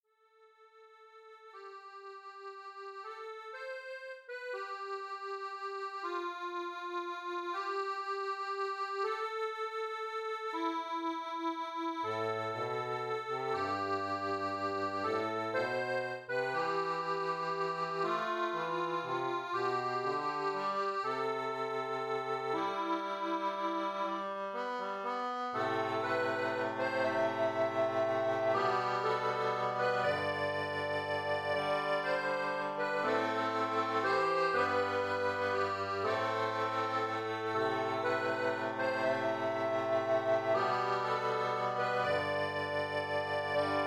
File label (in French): viel accordeon